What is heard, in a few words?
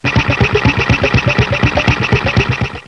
tractor